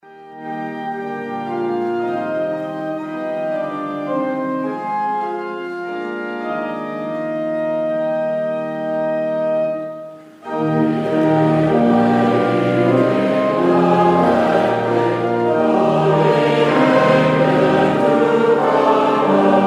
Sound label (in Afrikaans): Gemeentesang